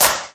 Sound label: Claps; Vengeance Samples